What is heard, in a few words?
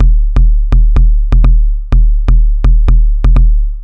Ambient House Kick 125BPM